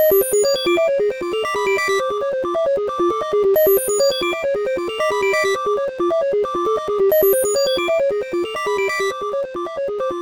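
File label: background music Computer; Irodzuki Tingle no Koi no Balloon Trip 2009 NDS gamerip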